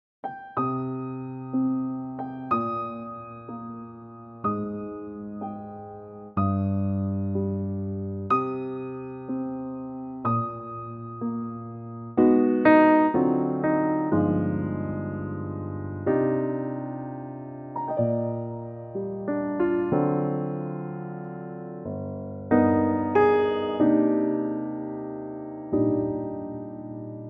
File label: Cm piano